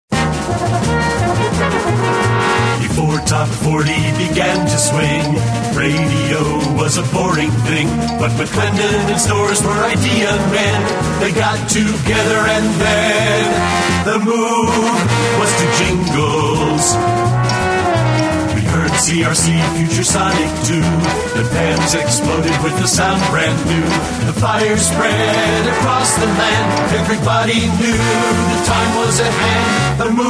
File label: jingles